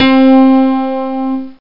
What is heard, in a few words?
grand piano; OctaMED SoundStudio V1; jazzies samples